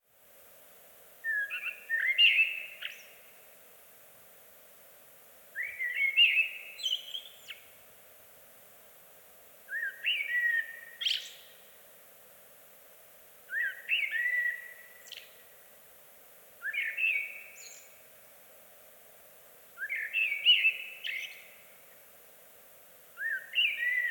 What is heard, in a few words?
Amsel Bird Blackbird